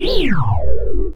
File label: Power Down